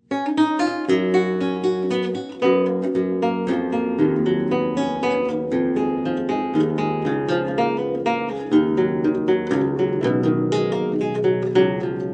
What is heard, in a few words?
Courante, lute